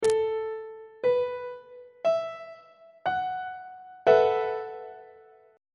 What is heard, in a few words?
c flat dominant 7th suspended 4th 3rd inversion on treble clef